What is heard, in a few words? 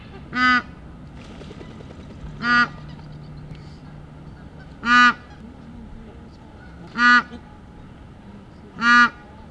Anser indicus; Bar-headed goose; The loud calls of a single bird